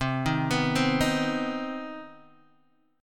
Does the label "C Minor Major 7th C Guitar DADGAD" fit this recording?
Yes